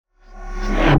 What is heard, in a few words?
Movements; 99Sounds; The Weird Side Samples